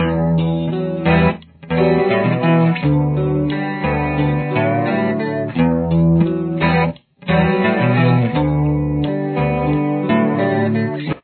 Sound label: bass